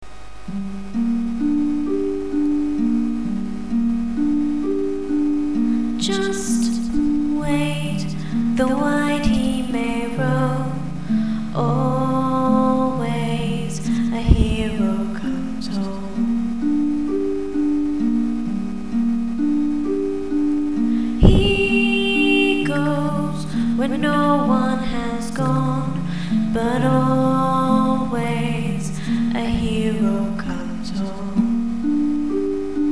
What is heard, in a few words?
Chimes